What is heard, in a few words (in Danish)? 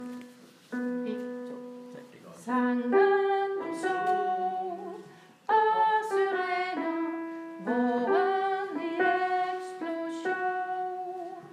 vårvise tenor